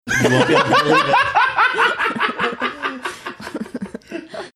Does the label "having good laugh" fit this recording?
Yes